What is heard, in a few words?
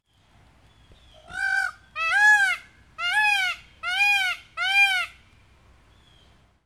Indian Peafowl; Crandon Park FL